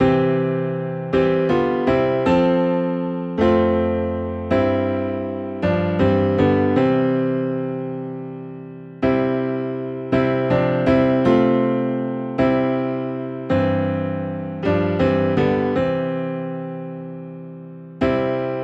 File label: Take Time to be Holy, Piano Low in C